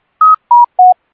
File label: wobbulator 3 times Pre-Announcement